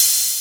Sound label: Hat, Dubstep One-Shot Drums